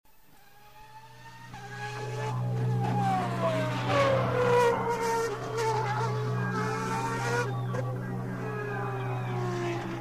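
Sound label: Sounds racing car; Gonochnaya mashina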